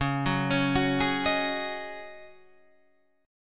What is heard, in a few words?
Db Suspended 2nd Suspended 4th